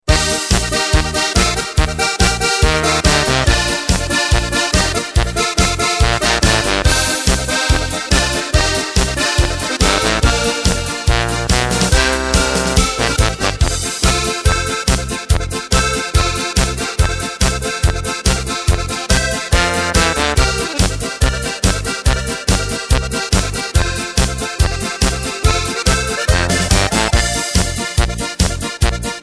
mit Drums Playback

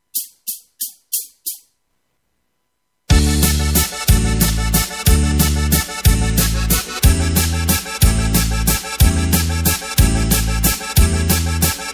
Walzer

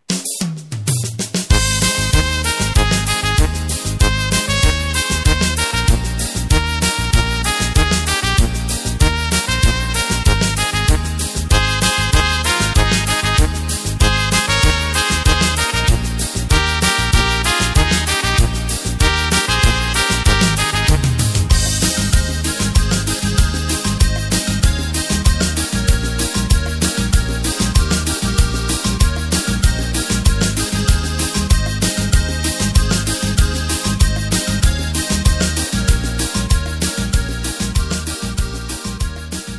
Samba Tirolerisch Playback; midifile

